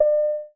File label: Ding